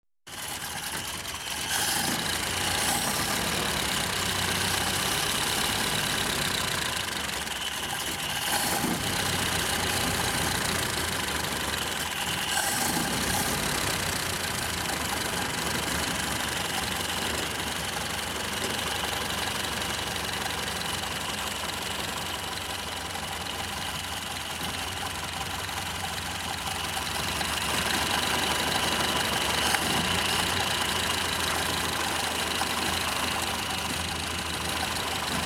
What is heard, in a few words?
Tractor Engine Noise